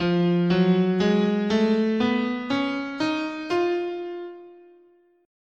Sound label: F Altered, scale